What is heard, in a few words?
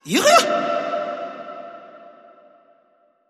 Vocal Fury Jungle Call